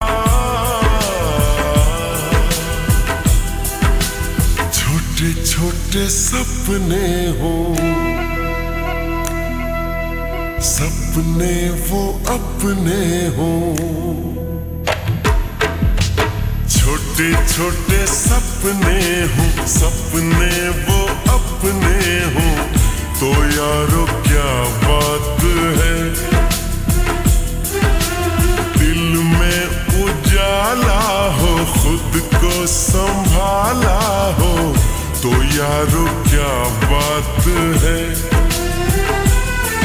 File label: slowed reverb